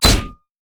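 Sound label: armadillo basic attack; rammus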